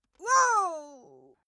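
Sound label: female death, screams; schreie; menschen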